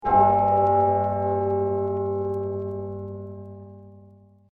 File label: Low Low Bell G